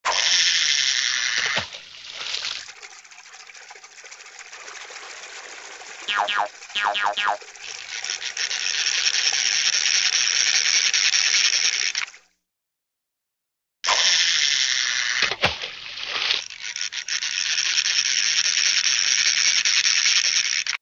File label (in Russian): multjashnye zvuki rybalki